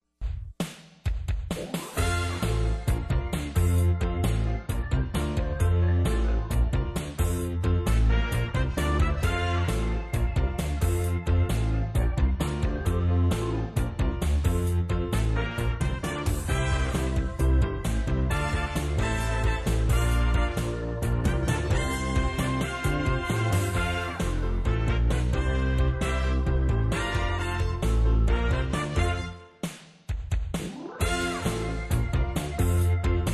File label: Jingle; Beckerle Lumber